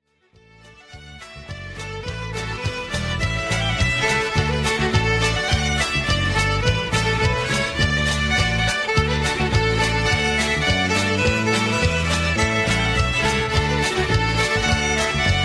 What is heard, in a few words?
Galopede